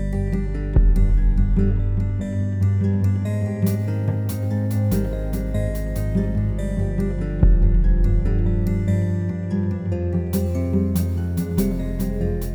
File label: C Harmonic Minor Etude In G